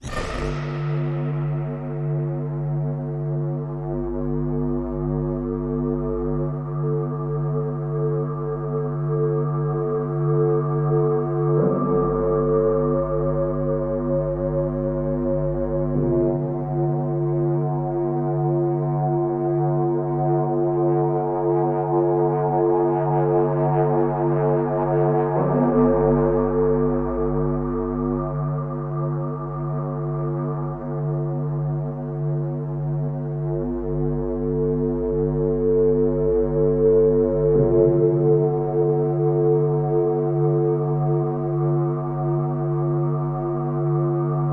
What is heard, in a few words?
Drone Head Music Pad